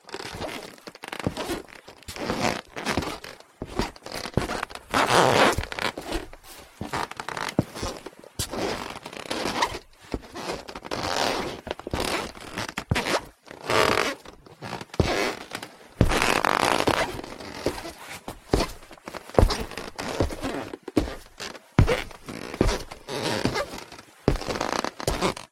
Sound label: floor